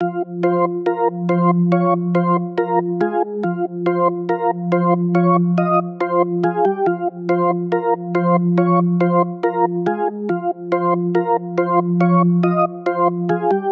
Synth Loops F 140; Trap and Dubstep

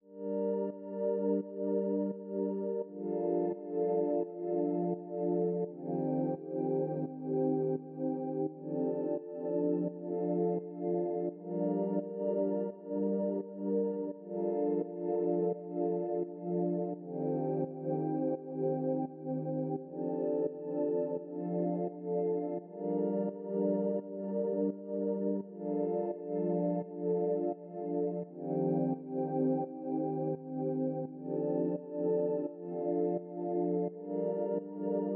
170 BPM G Min Pad; RnB; Loop Stems; Cymatics; All 4 U; 2022 Melody Collection

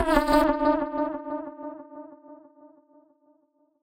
Voice D 138 BPM, Psytrance; Voices; Hits; Ghosthack; Psytrance Visions Volume 2

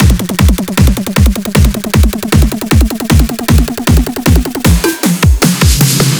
fill loop 155 4 bars; GhostHack

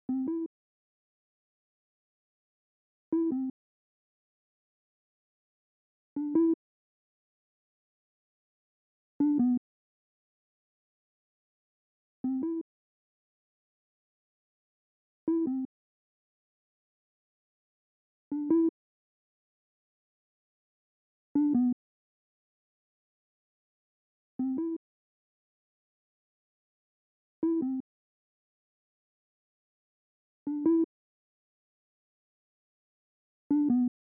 158 BPM E Min Synth, melody loop stem; Cymatics; 2022 Melody Collection; Weakend

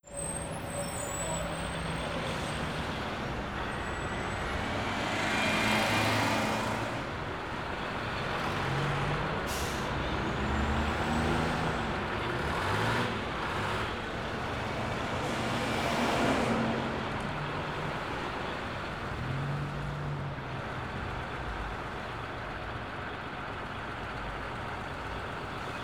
Bus Station Exterior; Ambience

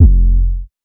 808 F; Cymatics; Oracle Sample Pack; 808s

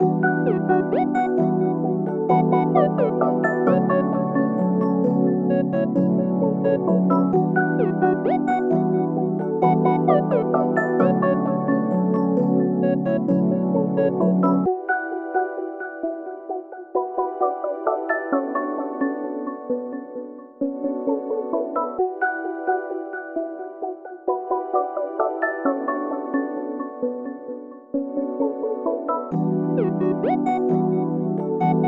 131 BPM B Min, Melody; Cymatics; 2022 Melody Collection; Wavy

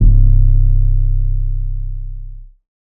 808 C; Advent Calendar - Day 9 - 808s

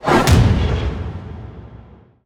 Whoosh Hit; Whooshes; Ghosthack; Luster

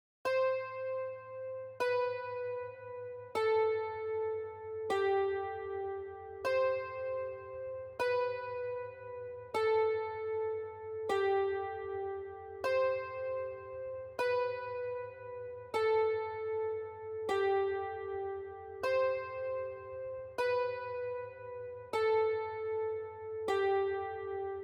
155 BPM E Min Dulcimer, loop stems; Cymatics; 2022 Melody Collection Platinum Expansion - Pt 1; Dubai

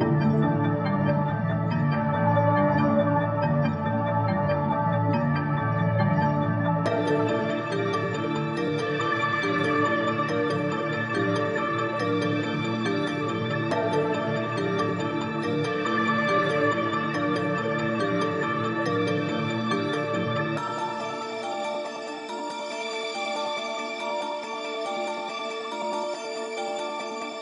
140 BPM D Min Keys, Hip Hop; Cymatics; Mercedes

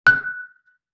Xylophone Single Note F#; Musical Elements

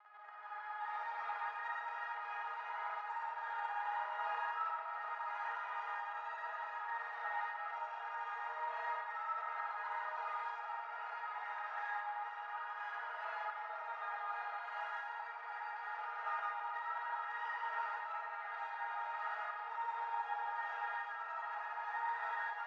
160 BPM G# Min Atmosphere; Melody; Loop Stems; Cymatics; 2022 Melody Collection Platinum Expansion - Pt 2; Stories

